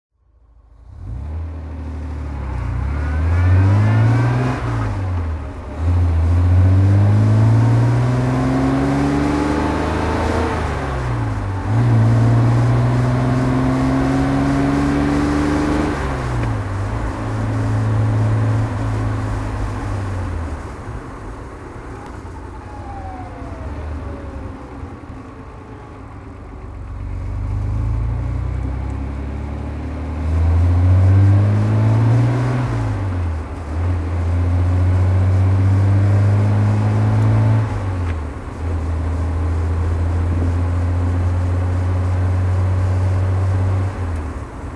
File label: austin healey 3000 mk1 hardtop driving